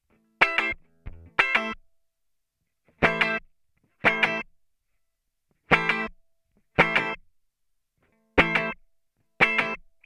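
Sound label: Channel LPP 2264 JR Limiter Funk; Heritage Audio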